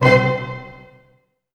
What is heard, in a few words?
ORCH STAC F#; ORCH STAC.-M